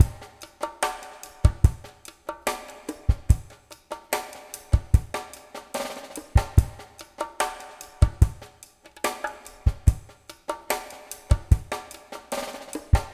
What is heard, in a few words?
BOL WETMIX, BOLERINO, Liquid Grooves; Spectrasonics; Liquid Grooves 1 & 2